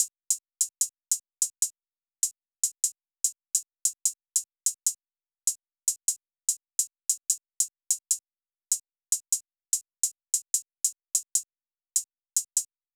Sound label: Hihat Loop 148 BPM, Drill